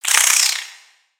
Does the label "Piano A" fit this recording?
No